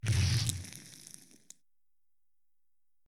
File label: torch on